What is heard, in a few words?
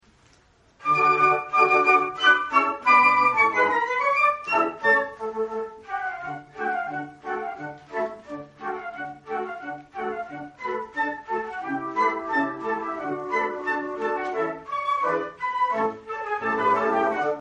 new year consert, flute